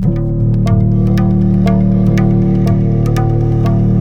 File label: Tabla, 120 BPM; Zero-G; Pure Tabla